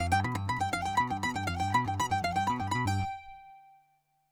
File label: bouzouki